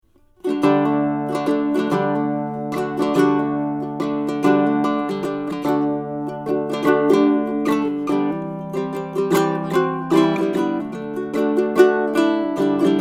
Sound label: Uke Baritone